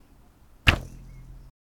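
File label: Bow String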